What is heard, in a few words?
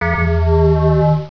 Bell Bend